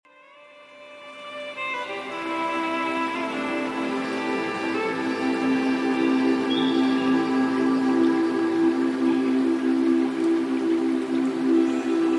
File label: Celtic Morning 432Hz Love Frequency Music with Happy Forest Sounds